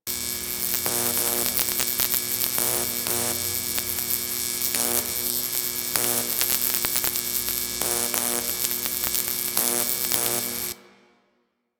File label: Light Flicker Loop; Ambience; Props; Warframe; The New War; Zariman; Quests